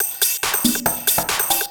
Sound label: hard beats 140, hard trance beats